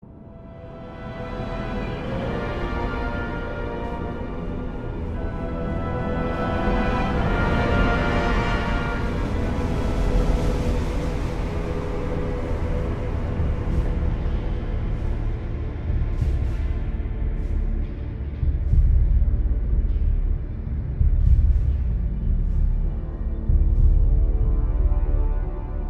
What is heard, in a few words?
Music Ambience; Hellfire Peninsula; World of Warcraft